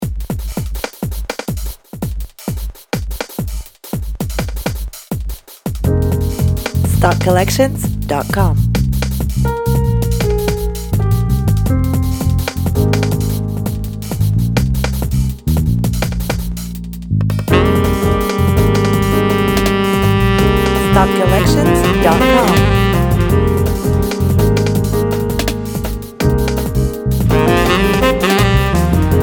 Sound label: 165BPM